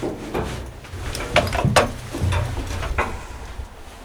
Vehicle reloads; Red Orchestra: Ostfront 41-45

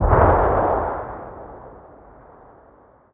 hit far; hits; World of Tanks Blitz

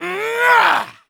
vce spin

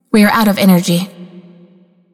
Alarms Sub Battery End; Liberty

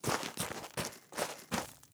dig; Rust

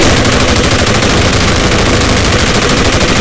Browning 2L; Weapons; DCS

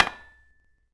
Hit Metal Spike; Civ4 beyond sword

